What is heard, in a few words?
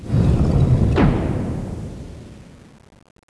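stone door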